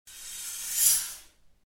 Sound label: swish